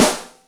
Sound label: Rold Rim, Yamaha DTXpress Acoustic Snare